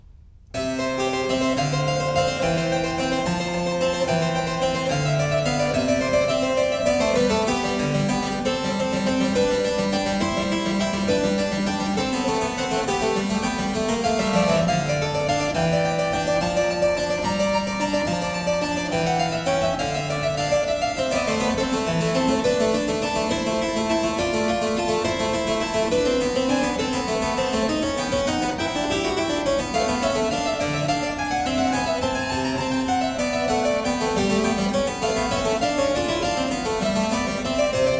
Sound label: Prelude & Fuge in C sharp major, Keyboard; No.3; BWV 848; J.S. Bach; The Well-Tempered Clavier Book I